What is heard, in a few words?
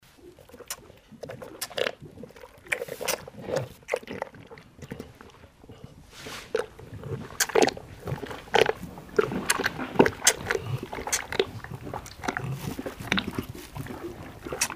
calf drinking bucket of milk